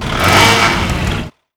vehicle moto shift in; mohaa

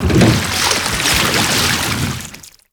row boat slow; mohaa; mechanics

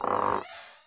creaky board; mohaa

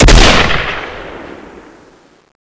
barrel; weapons; xash3d fwgs; urbicide

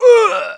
hit, pain; mohaa

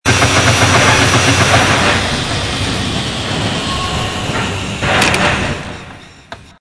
Electric Cart Stop, vehicle; mohaa

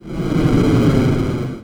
nuke fall; cdogs; funwithguns; techdemo

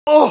hit, pain; mohaa

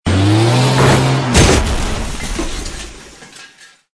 Jeep Roll; vehicle; mohaa

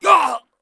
death; mohaa; dialogue

